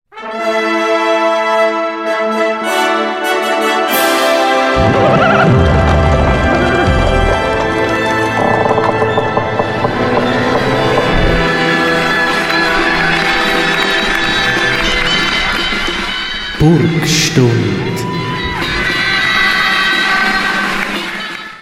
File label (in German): Jingle; Burgstund